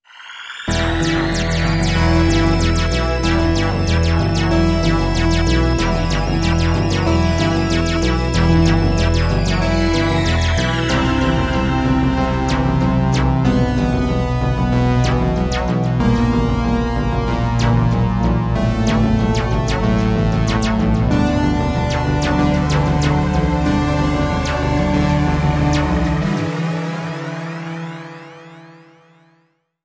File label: Ambient